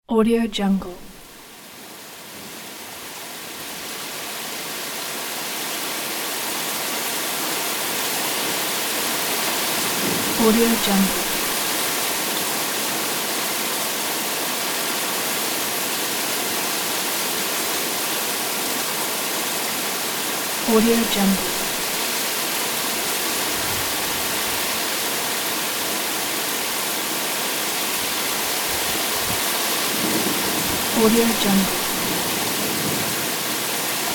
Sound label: rain; dakochan